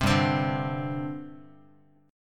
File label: G Suspended 4th G down Guitar; strummed; JGuitar